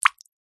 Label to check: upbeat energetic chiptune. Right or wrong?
Wrong